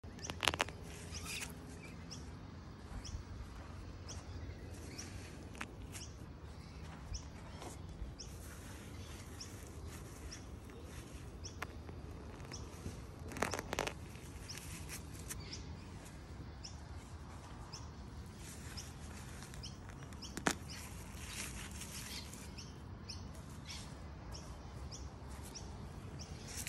Weeding; کندن علف های هرز

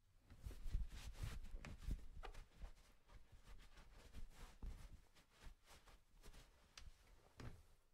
Dry body with towel

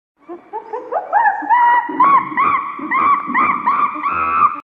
Bonobo

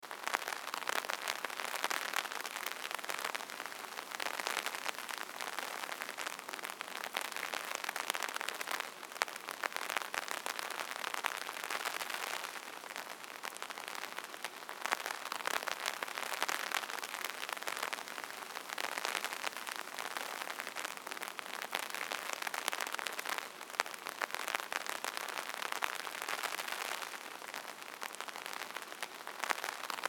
rain on umbrella weather loop

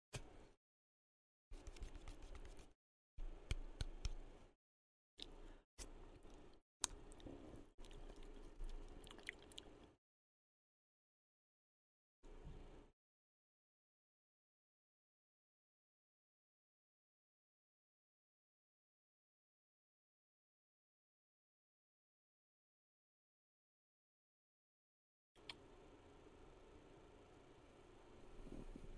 Yogurt ASMR

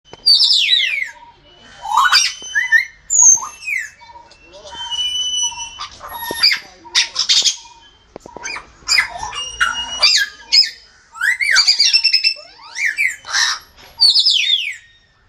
sound grey parrot

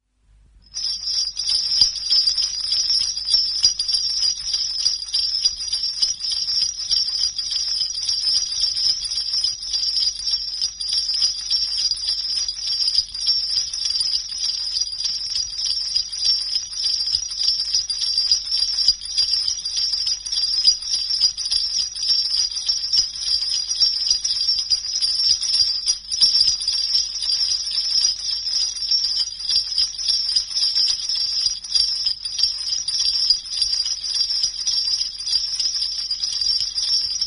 Sleigh bells ringing

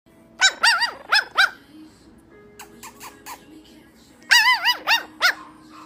Papi dog; سگ پاپی

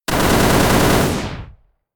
machine gun

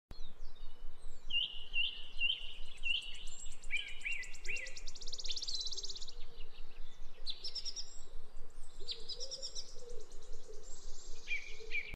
Wallcreeper

